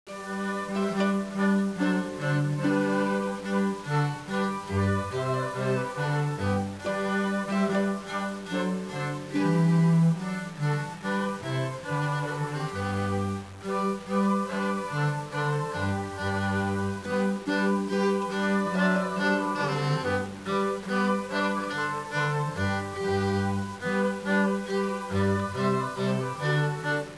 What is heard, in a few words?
music; Leonardo The Inventor; Broderbund; Riverdeep